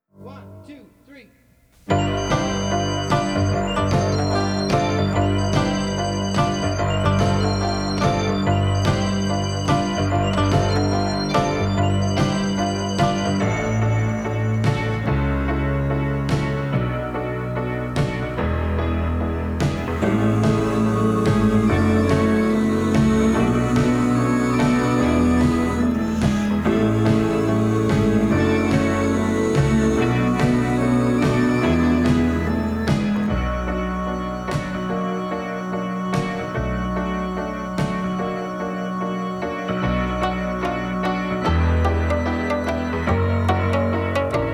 back track with partial vocals

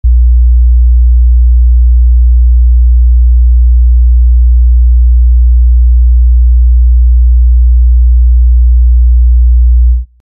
60 Hz

